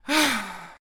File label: Sigh; Princess & Conquest v0.20.06